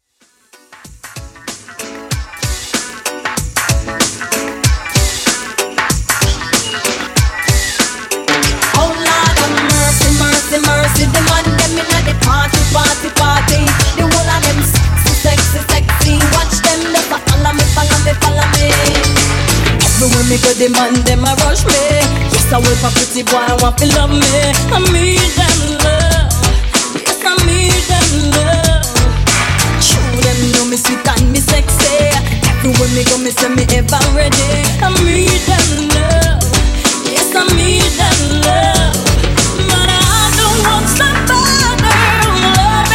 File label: Extended Mix 95Bpm